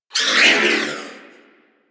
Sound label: Missile Tail; DE; Warframe; Weapons; Archwing; Powers